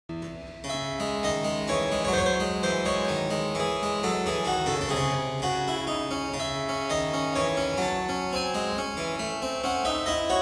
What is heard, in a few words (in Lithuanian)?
Prancuziskos siuitos Nr. 1 d-moll Menueto II; J. S. Bacho